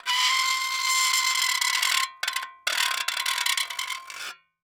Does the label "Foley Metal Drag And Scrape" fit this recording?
Yes